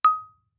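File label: Marimba cord fortissimo Eb4; MIS Pitches - 2014; Percussion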